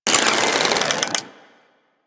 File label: Hunting Bow Draw 3D; Warframe; Weapons